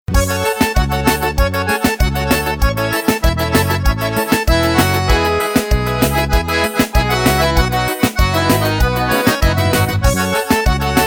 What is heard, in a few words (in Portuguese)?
arrasta mistura louca sem o funk